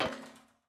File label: Battery Put Down